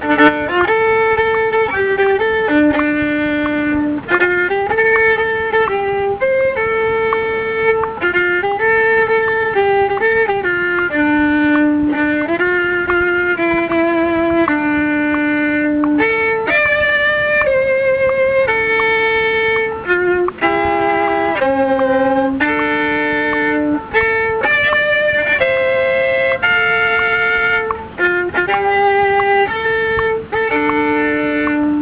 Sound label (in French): violon